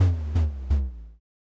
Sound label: footsteps, nes sound generator